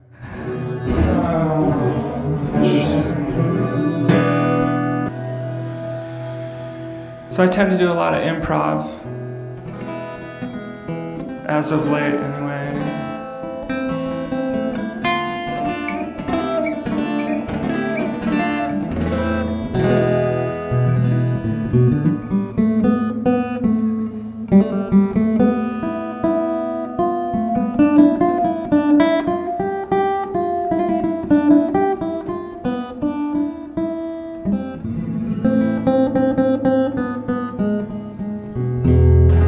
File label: Improv On Acoustic Guitar